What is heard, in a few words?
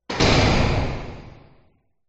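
Prison Door Slams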